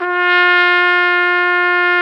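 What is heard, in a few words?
trumpet, harmon dry; Solo Brass; Trumpet 5-7; Tp 5 RCA Jaz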